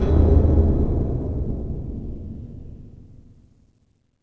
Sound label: Space Junk Bump; Ambient; Sid Meier's Civilization 4 Complete; Beyond the Sword